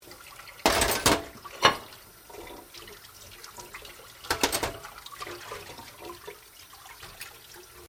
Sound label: Washing Dishes Running Water; Household Appliances; Appliances (Sound Effects:Household)